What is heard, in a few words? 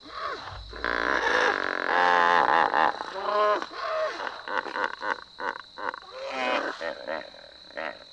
African Animals Crix